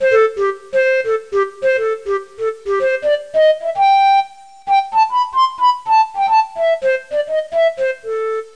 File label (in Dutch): FLUIT